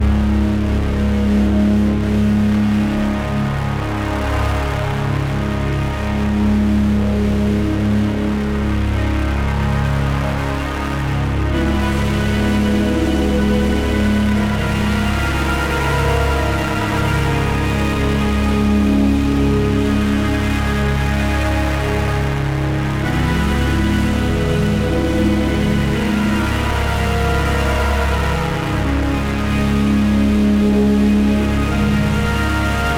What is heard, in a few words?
fuzz organ